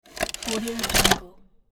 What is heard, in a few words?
video tape insert servos